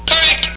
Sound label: correct